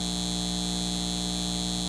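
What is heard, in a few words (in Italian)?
Elettrico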